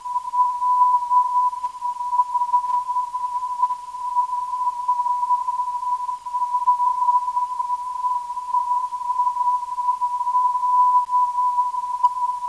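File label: non-directional beacon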